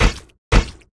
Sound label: Run